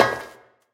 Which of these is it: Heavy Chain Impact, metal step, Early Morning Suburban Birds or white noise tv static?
metal step